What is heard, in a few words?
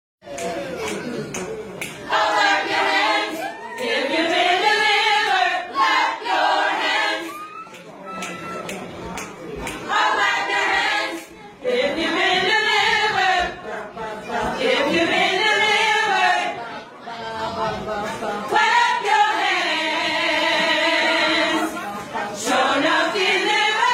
Vocals; the spirit of christmas concert